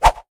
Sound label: Toss Grenade